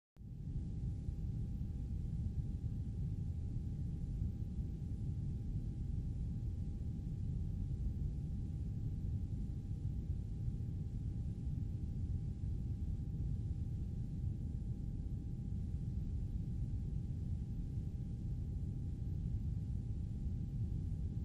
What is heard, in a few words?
Room Tone High Hum, hum